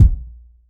fluffy kick single hit a sharp key, Royality free kick single shot tuned to the A# note; kick drum samples